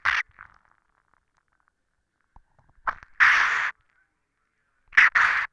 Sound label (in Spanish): toma mate; Oído Absoluto